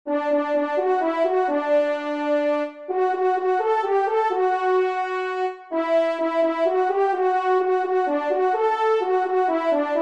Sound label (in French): trompe; Souvenir de Sully; 1e Trompe